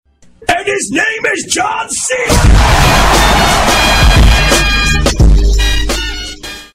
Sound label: duh Duh Duh Duuuh